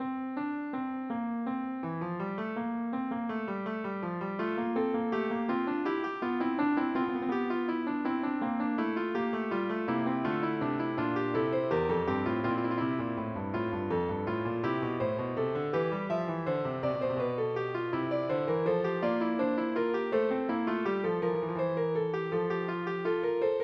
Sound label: fugue11; 3800 MIDI Magic; bach